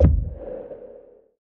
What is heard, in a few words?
explosion; lisp